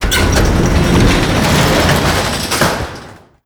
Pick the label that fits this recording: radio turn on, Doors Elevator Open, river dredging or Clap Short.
Doors Elevator Open